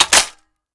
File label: mag in, weapons; cw ak74